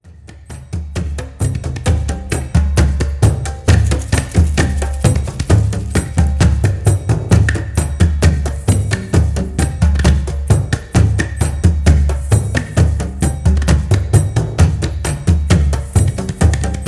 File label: relax cargo drum; powermusic